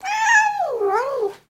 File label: Cat Frustrated Meow